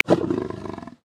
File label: Snow Leopard; Xiaomi MIUI-14; South West Mountain